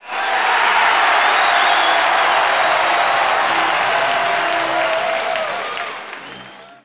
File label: clap, stadium; hockey95